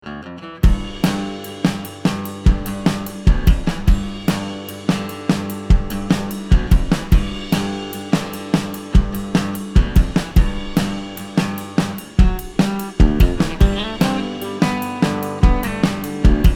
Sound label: Noodle Riff